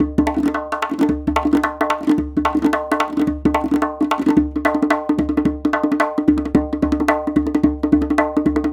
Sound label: perc loop tombak tribe, 110